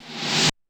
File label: Dance Kit, Korg 01W